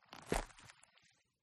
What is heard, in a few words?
Footstep Step Dry Grass Shrubs Pine Needles Meadow; PMSFX